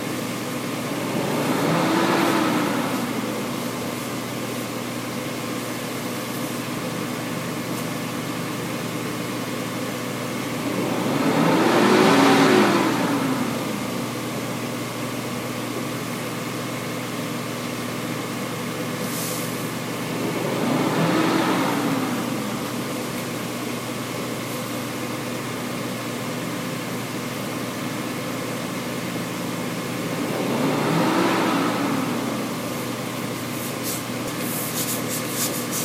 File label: dell inspiron fan noise